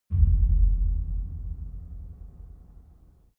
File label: far outer space shadow, hits impacts